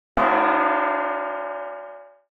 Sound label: Metal Pan Impact Hit - Large Baking Tin; Hollywood Edge; sound designer's toolkit v2